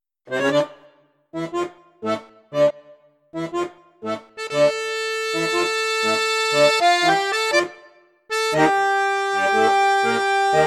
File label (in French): registre basson flute juste, accordeon; mengascini f5